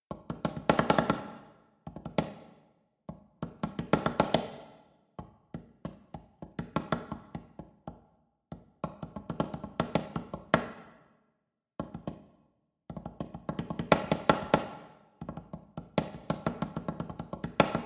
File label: percussion solo; doste raif